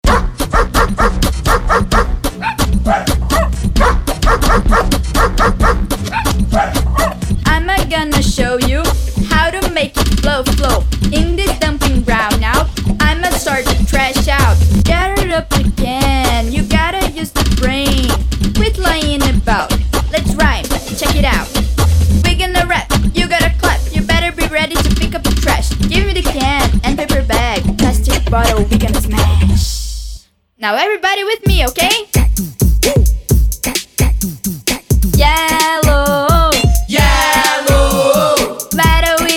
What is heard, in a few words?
funk